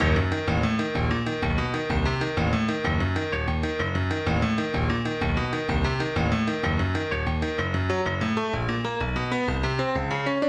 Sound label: Tarantella in G Minor